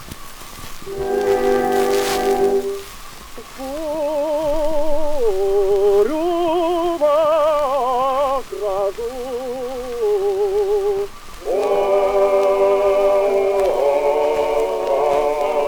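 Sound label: khor, choir; bukke sirena grand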